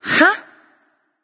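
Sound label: confused, female; smite; voicepack; hera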